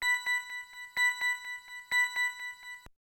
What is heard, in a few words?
Energy Orb; Castlevania Bloodlines; The 16bit Audiophile Project; Genesis